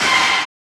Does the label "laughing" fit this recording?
No